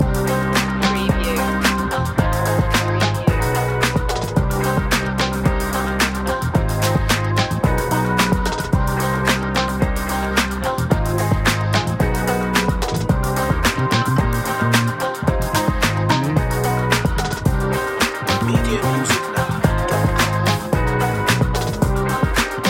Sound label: glam style loop, urban rnb hip hop